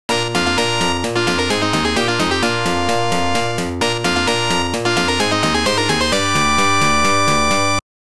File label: Opening Music; the return of ishtar original soundtrack